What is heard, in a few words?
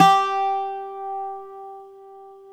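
BZ P G, bouzouki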